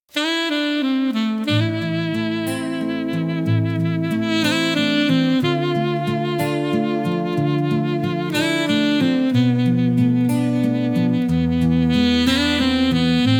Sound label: smooth sax journey